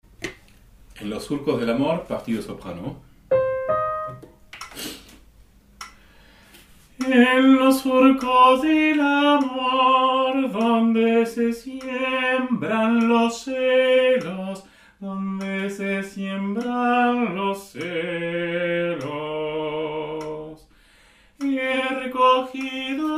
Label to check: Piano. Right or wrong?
Wrong